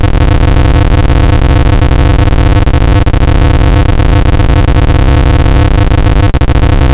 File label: noise 0C